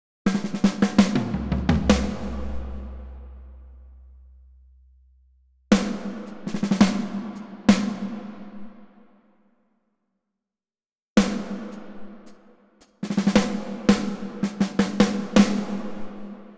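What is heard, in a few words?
Drums Hall of the National Academy of Music, Kyiv; Soul Samples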